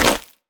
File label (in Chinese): 红树根破坏声